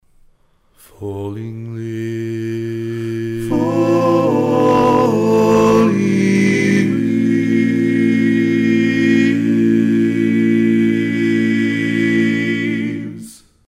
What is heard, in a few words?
Bass, barbershop tags